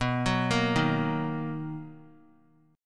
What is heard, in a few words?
B Major 7th B down; arpeggiated; JGuitar